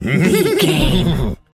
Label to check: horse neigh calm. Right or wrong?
Right